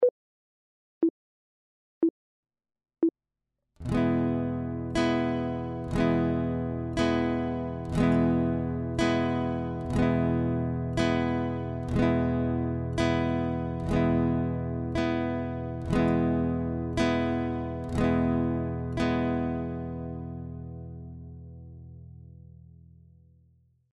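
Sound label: chord, guitar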